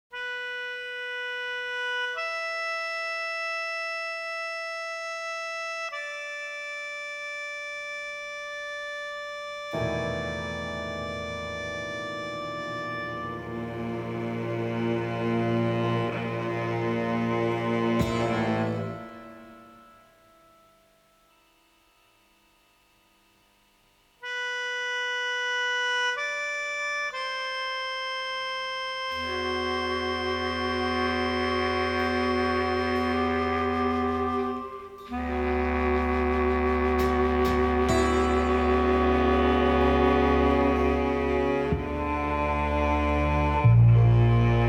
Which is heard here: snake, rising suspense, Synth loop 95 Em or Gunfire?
rising suspense